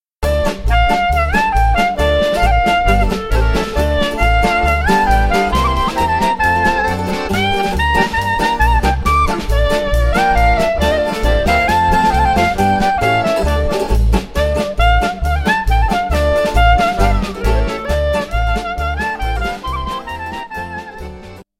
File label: klezmer; Israel; Jano Janke